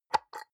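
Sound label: soap dispenser press almost empty soft, bathroom; haus-garten; badezimmer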